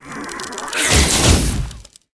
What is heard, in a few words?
plant troops attack, monster